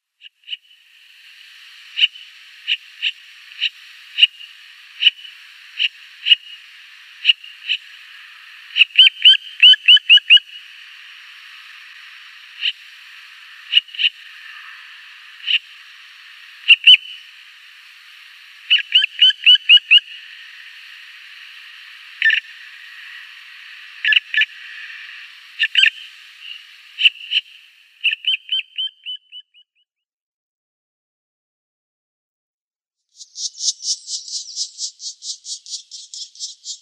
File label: Black Woodpecker nestlings